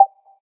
volume key; LG G4c

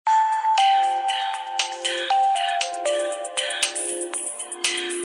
Audio Test Right

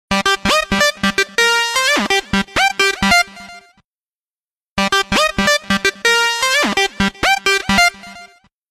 Transform, ringtones

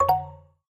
Hint, notifications; Samsung Galaxy S7

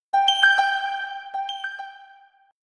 Sonar

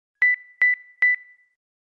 Low Battery, Event sounds; LG KF900 Prada II

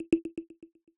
no; Apple; iOS-18; HeadGestures.framework; experimental

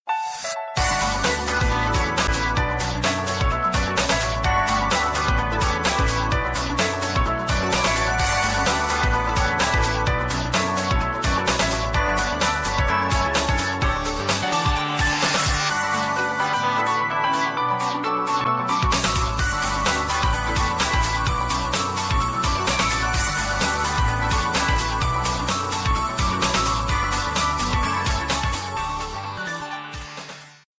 Twilight; LG; P520 Terry

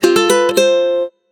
Ukulele; Wiko; Jerry-2

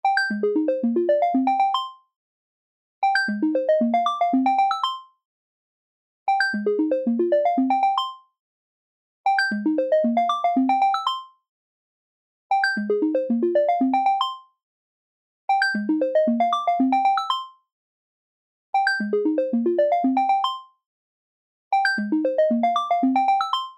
Simple Response, ringtones; LG K92 5G

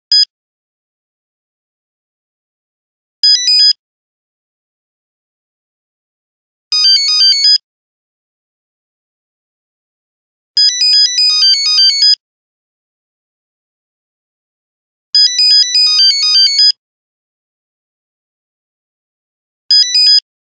Nokia 5110 Persuasion, Nokia Buzzer; Windows Phone apps

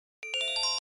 Sms Plus, phonetones Message tones; KP500 Cookie

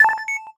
Call connect, system; Samsung; GT-S7350i

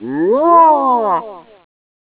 big tap; Nokia; 5800 XpressMusic; Bounce Touch

